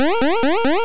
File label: Metronome; sfx; attack moves; pokemon; gen 1; rby